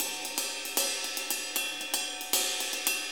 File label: Jazz Rock Ride; Sonic Foundry (Sony Creative Software); Loops & Samples Drum Tools